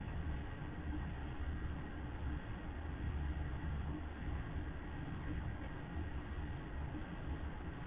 Rumble Loop

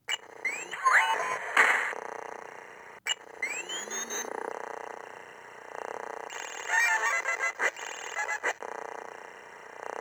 Space car

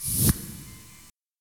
Airpipe Swoosh

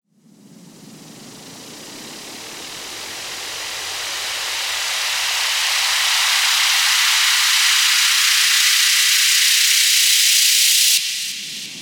Uplift

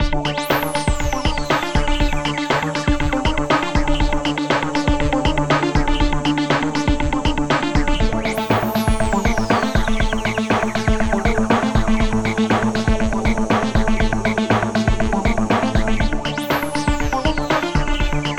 Falling Birds Perc Loop

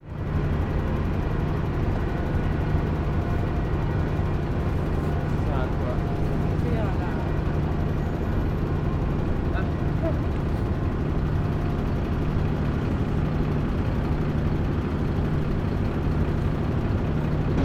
Motor Boat

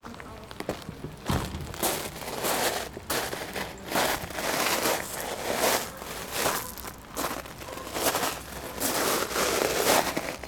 Deep Gravel Walking

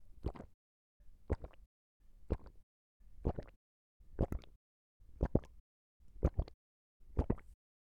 Drinking

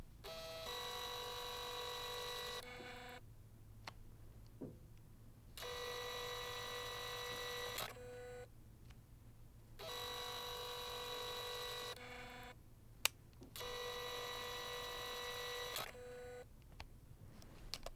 Camera zooms

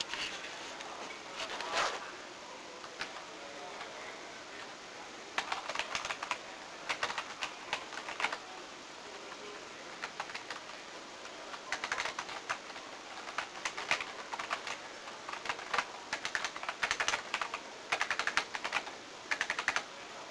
Office

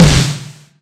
Tampan Stereo Snare

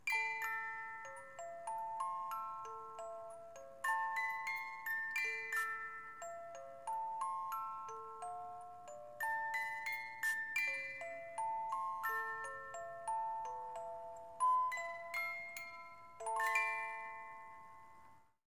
Music Box Feeling

